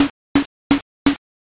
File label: tatami step